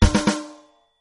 chain; tenaPuyo

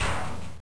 low crash; madcars2; radicalplay

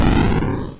bomb; doublespeakgames

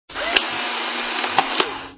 Polaroid; bodilies

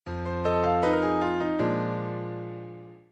level up; tenaPuyo

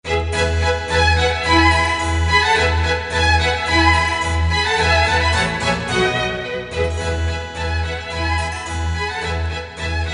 bgm spring

